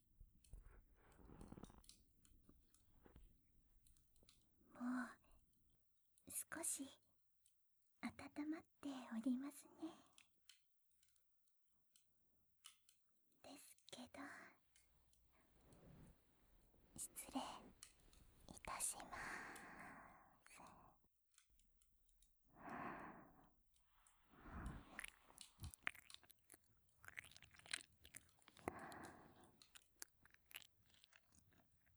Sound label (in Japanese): ゆっくりあまがみ左; 初日・囲炉裏